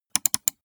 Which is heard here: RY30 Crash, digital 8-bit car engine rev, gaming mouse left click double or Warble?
gaming mouse left click double